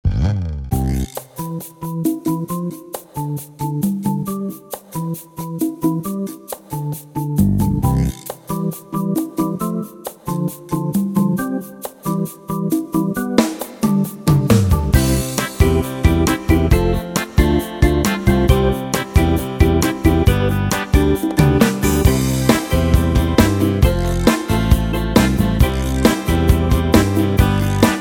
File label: Keba Beguine; tyros2; Modern Folk